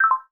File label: drip